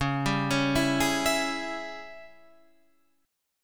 Ab Major 7th Suspended 4th Sharp 5th Db, Guitar

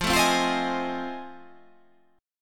F 7th Sharp 9th

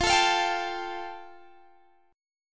F Major Add 9th Guitar DADGAD; x,20,19,22,22,19; down; chord shape

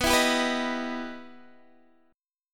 E Minor Major 7th

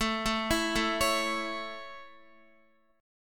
A Major, Guitar; arpeggiated; JGuitar; x,12,9,9,9,9

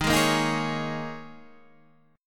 G sharp Suspended 2nd Suspended 4th, guitar; 11,11,8,8,9,9

